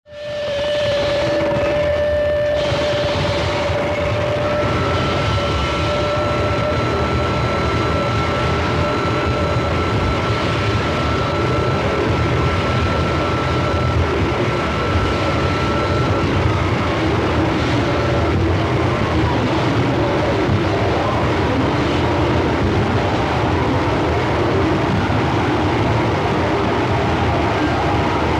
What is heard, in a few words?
Metal Noise